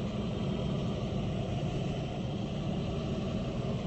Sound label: Meteor Approaching; lethal company